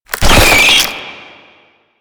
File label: Weakpoint Appear; Warframe; Nineteeen Ninety Nine; Infested Survival